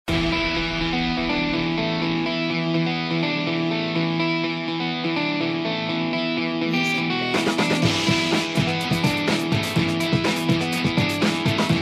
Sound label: Breakbeat